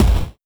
Kick, 8-Bit; Games And 8-Bit Kit Volume2; atomsplitteraudio; chiptune

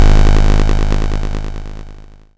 Bass, 8-Bit; Games And 8-Bit Kit Volume2; atomsplitteraudio; chiptune

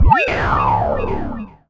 Teleport, 8-Bit; Games And 8-Bit Kit Volume2; atomsplitteraudio; chiptune

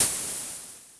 High Hat Opened, Lo Fi Drum; High Hats; MisfitChris Lo Fi Drum Pack; chiptune

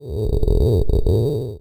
Vocalish, 8-Bit; Games And 8-Bit Kit Volume2; atomsplitteraudio; chiptune

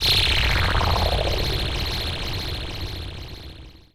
Zapper, 8-Bit; Games And 8-Bit Kit Volume2; atomsplitteraudio; chiptune

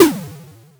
Chaos, Lo Fi Drum

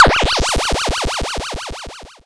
Zapper, 8-Bit; Games And 8-Bit Kit Volume2; atomsplitteraudio; chiptune

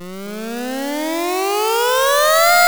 Build, 8-Bit; Games And 8-Bit Kit Volume2; atomsplitteraudio; chiptune

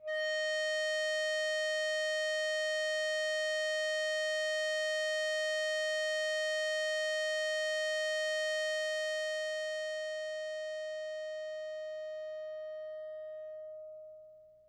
EBow Guitar D4; chiptune; symfonysid

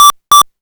Hard PCM Chip; chiptune

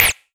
Blip, 8-Bit; Games And 8-Bit Kit Volume2; atomsplitteraudio; chiptune

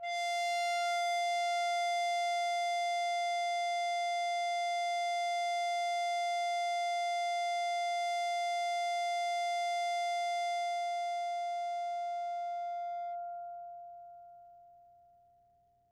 EBow Guitar F 4; chiptune; symfonysid

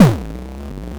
Chaos Kick, Lo Fi Drum

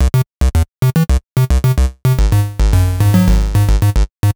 Synth Loop 110bpm 8Bit Beats; chiptune; producerloops

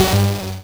Lead, 8-Bit; Games And 8-Bit Kit Volume2; atomsplitteraudio; chiptune

